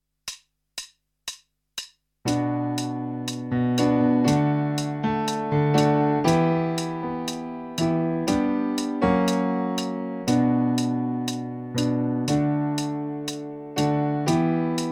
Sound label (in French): Piano Rythmique Clic; akai apc mini